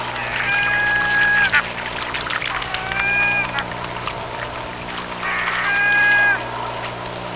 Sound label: Shore birds chirping